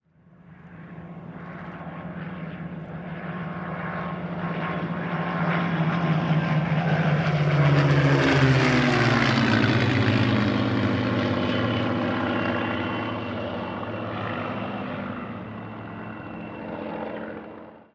Spitfires And Bf 109 Flyby

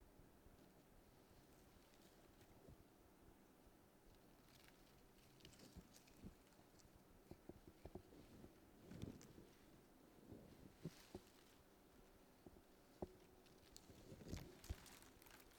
Rustling Leaves

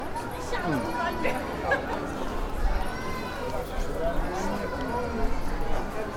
Market

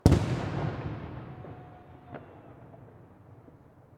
Mortar Grenade Shell

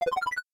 Access Computer

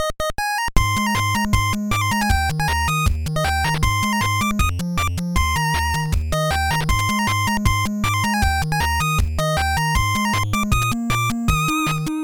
8 Bit Adventure Theme Intro

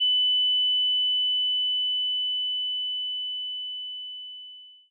Ear Ring

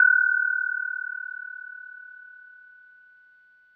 Pling

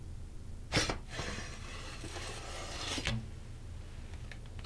Pulling Out A Sword

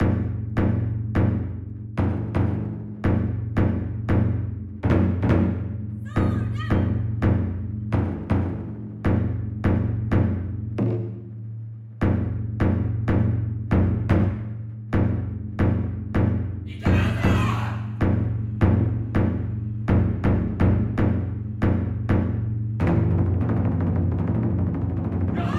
Taiko

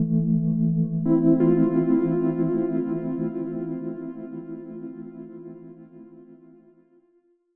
Melancholy In A Min

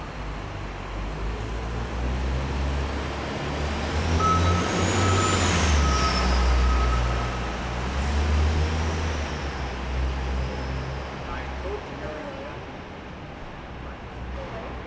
Bus

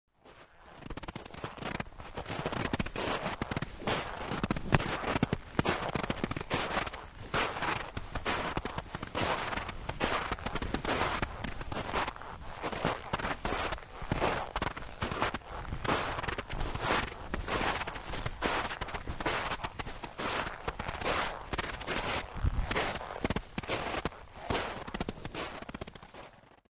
Snow steps stereo

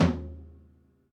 Tom Tight High Vol

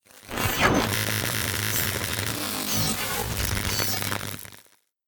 Electric Shock

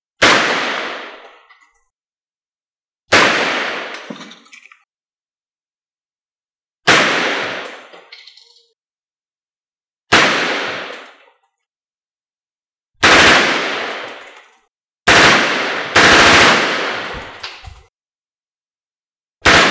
Real M16 Shot

